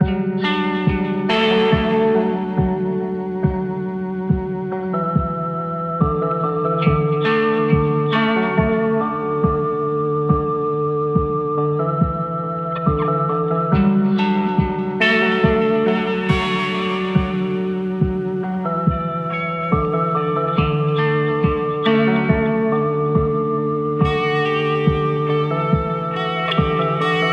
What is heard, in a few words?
LOFI AMBIENT